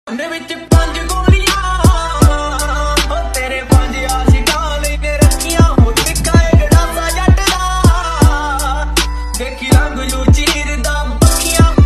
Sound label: punjabi song ringtone; new punjabi song